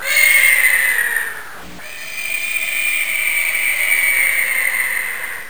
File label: Pterodactyl Screem; Saban